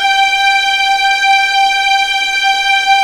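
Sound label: G5 legato forte, violins; East West; The Ultimate String Collection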